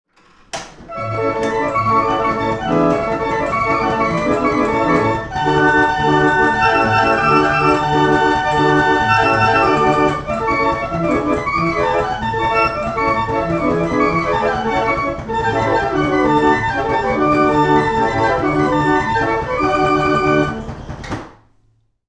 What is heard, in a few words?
circus; Five Nights At Akers; fnaf